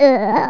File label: GROAN; JOHNNY